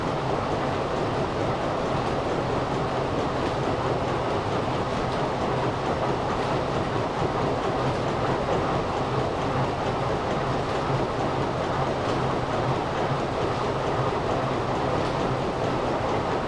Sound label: Third floor sifters atmo, mill